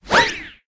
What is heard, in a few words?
popper zip; Bttftg; wii